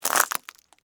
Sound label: Texture, Crunch, Short, Pasta, Shells; Crunches; Sound Effects:Objects